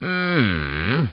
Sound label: humm; BattleSNDS